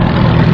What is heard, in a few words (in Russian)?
rabota lodochnogo motora